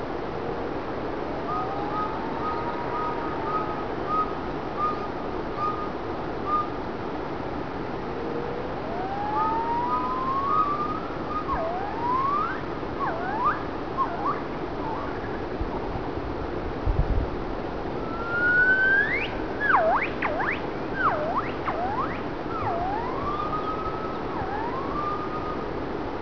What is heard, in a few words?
conc Duett, gibbons; Duet song, Xujiaba, Ailao mountains, China, 26 Aug. 1990. The great calls of this female are particularly short.